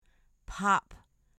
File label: pop